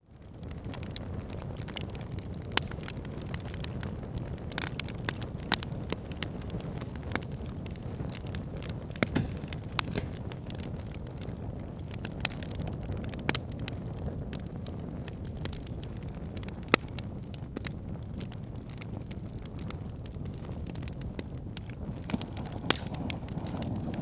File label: campfire; nature